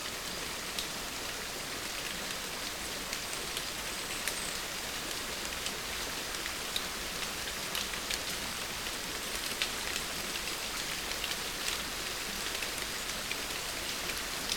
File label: in the forest in the rain